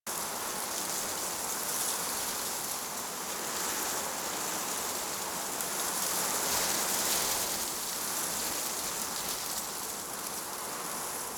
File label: Tree Wind Loop, Ambience; Props; Warframe; Duviri